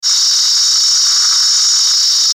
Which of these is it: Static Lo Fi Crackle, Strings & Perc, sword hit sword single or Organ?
Static Lo Fi Crackle